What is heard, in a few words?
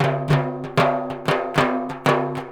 TIMBAL, Indian Traditions; USB Soundscan vol.45; AKAI